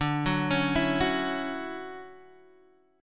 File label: D-Major 9th, D, Guitar, Open D